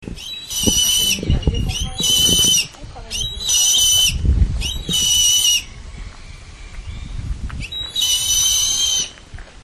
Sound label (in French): chouette hulotte